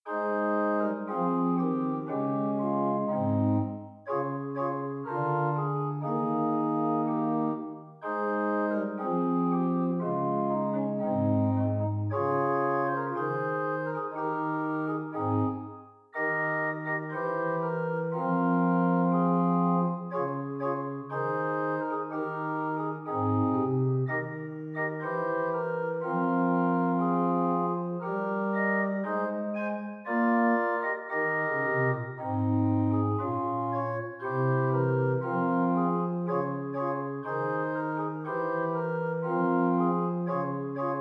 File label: Wet, organ